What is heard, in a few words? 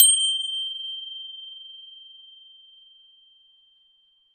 Cymbal